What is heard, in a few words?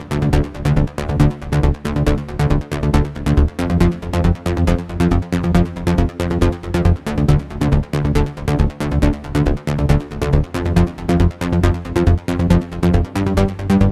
Bassline 138BPM WET, D minor; Vengeance Samples; Nightfly Kit